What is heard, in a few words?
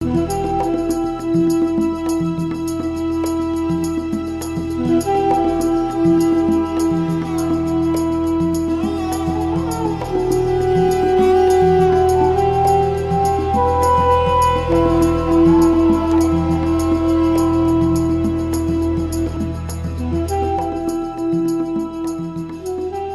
duduk night; Duduk + orchestra